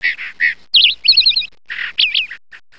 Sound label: in loow bird